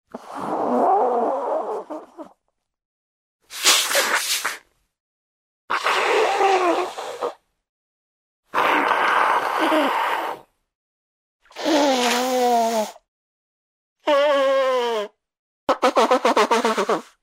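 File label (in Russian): сморкание человека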